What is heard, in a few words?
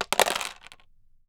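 roll, dice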